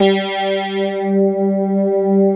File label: VOCO, SYNTH